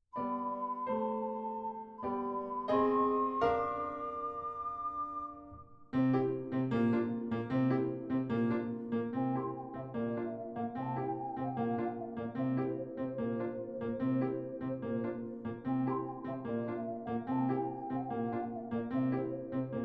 Accompaniment, ocarina; Kokiri Forest

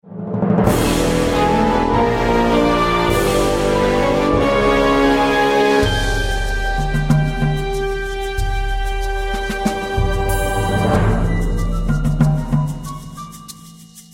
opening fanfare; Might and Mayhem

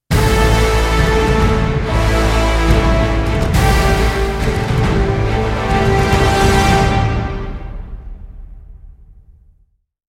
Fight Finish Jungle; Marlow Briggs and the Mask of Death

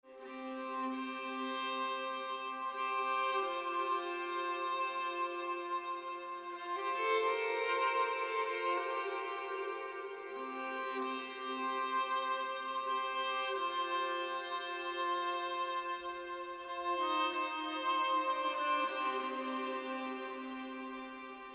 Exploration Mesa Outskirts; Horizon Zero Dawn

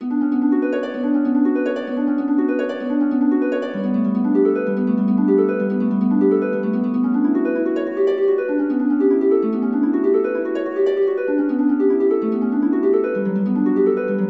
Harp; Gravity Compass; windows gamerip 2016

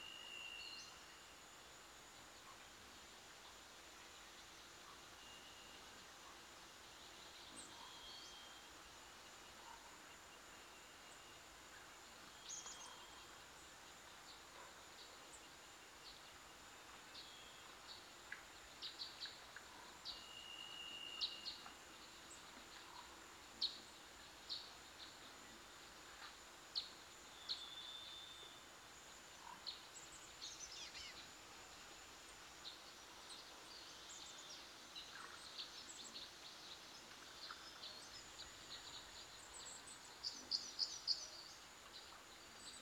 Grounds (Ambient, Dusk); lego harry potter years 5 7 windows gamerip 2011

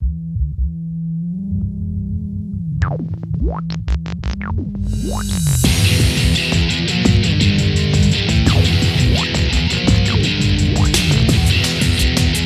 BGM; Adventure Player; psp

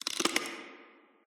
wind; chica; Five Nights at Ronald's

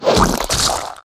Ink; The Battle for Wesnoth

